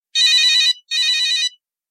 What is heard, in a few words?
Ring Nokia Mono